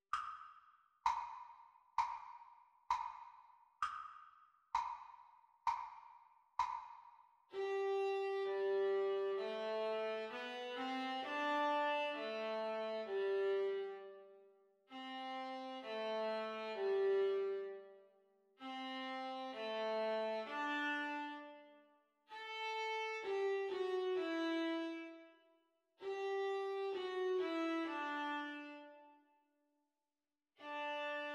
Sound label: Viola Duet Playalong Practice Speed